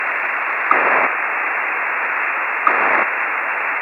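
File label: FIREBIRD, satellite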